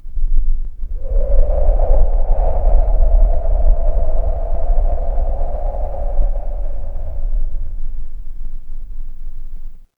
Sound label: horror wind whisper